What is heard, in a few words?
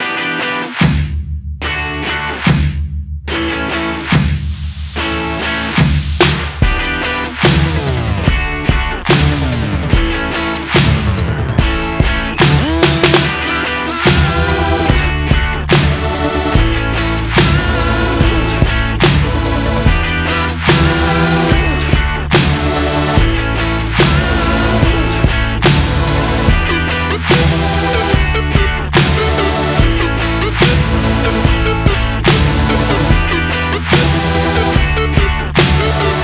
Groove; Unity Of Noise